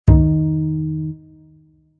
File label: Jazz Bass Loop Pluck D#m 180 bpm; Jazz bass loop in D# minor at 180 bpm; Bass Loops